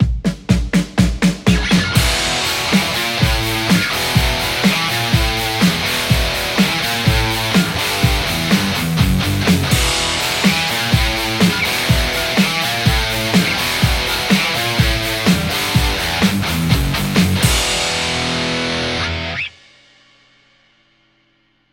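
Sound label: Telecaster; tse x30 v1 6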